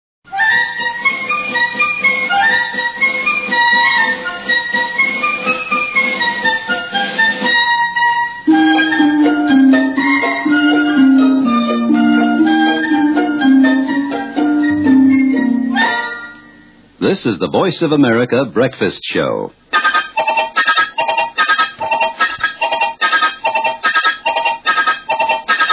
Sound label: interval signal; voa